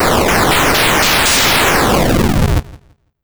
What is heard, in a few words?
fire storm